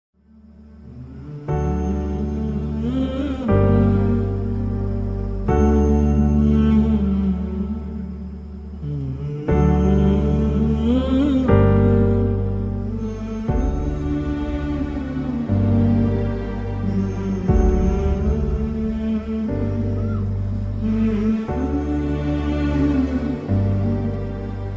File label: sad bgm father son bond sad bgm; bigil; rayappan